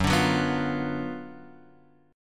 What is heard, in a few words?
Gb Major 7th Suspended 4th Sharp 5th, Guitar, Open F; strummed; x,1,2,1,2,0; JGuitar